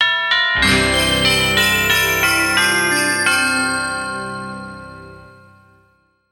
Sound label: Christmas Chime Bridge